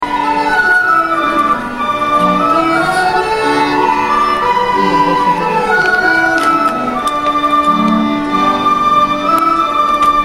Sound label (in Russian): Kuraj tatarskij nacionalnyj duhovoj, Tatarskie narodnye melodii; Kurajchylar